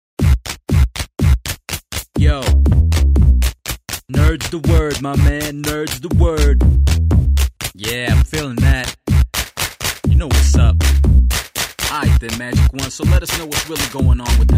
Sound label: Rap version; AVGN